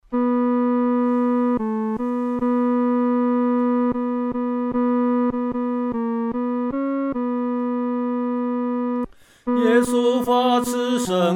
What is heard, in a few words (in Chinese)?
独唱 第三声